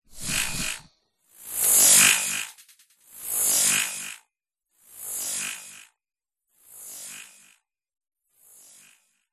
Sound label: futuristic electricity pulse sci fi robotic robot cyber futuristic energy machine drone